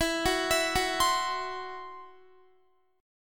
E Suspended 2nd Sharp 5th E, down, Guitar; Esus2#5; arpeggiated

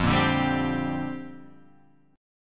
Bb 6th Add 9th F

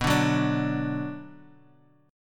B Minor, Guitar, Standard; Bm strummed; 7,5,4,7,x,x; JGuitar

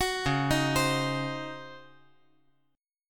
Db Major 7th Suspended 2nd Suspended 4th, Db

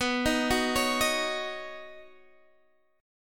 B Major Add 9th, B, down, Guitar Drop D

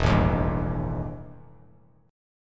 E Minor Major 7th Double Flat 5th E; strummed; JGuitar

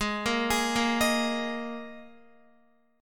Ab Suspended 2nd Guitar; arpeggiated; Absus2; x,11,10,13,10,11

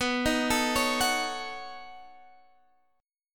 B 9th B down Guitar Half Step Down

